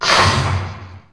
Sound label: Menu Select; DunDef